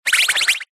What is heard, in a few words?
vehicles bicycle wheel slow roll rub squeak concrete ground; zapsplat